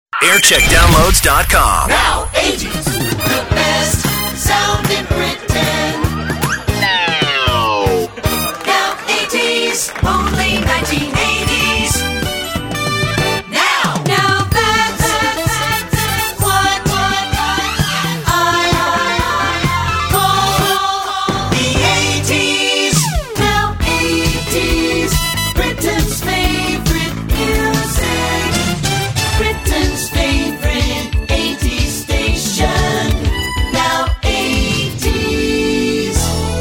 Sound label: Jingle Montage; Now 80s; JAM